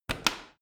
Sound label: washing machine rotary button press hard